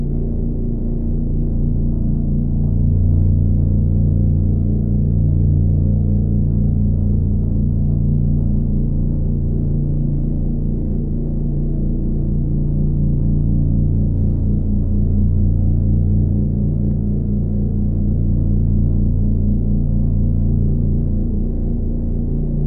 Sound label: Orbiter Engine Loop; Ambience; Gameplay; Warframe; The War Within